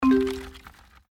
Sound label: hit